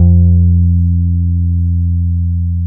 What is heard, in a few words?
BASS F-2 OLD, electric bass